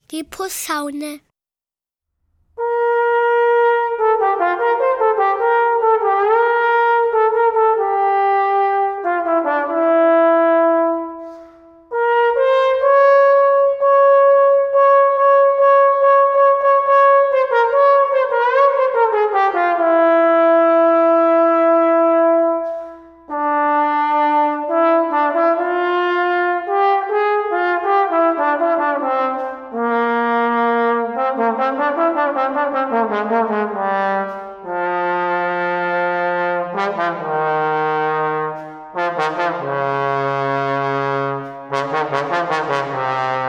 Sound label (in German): Posaune; Bilder einer Ausstellung